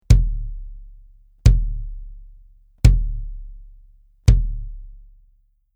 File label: BASS Hard Plastic